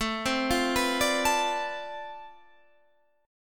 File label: D Minor 13th A